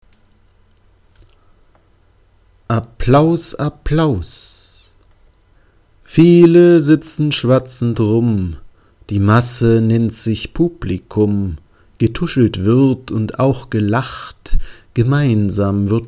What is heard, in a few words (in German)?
applaus applaus